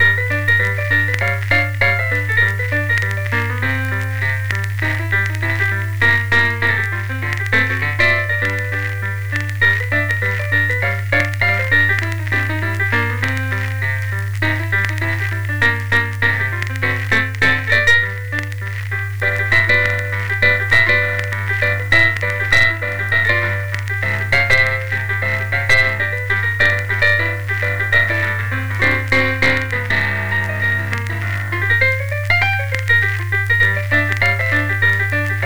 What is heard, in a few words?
piano, music; Kung Fu Chaos; XBOX